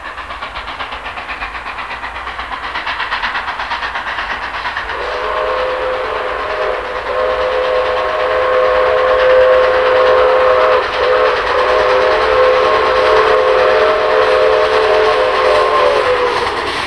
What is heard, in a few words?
TRAIN; treni